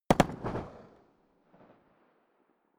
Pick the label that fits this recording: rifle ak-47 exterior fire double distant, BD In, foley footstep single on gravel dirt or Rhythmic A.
rifle ak-47 exterior fire double distant